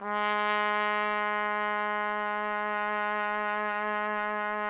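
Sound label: TPT, trumpet; Roland - LA Composer vol. 3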